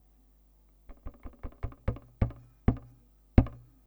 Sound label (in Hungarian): kopogás mély, guitar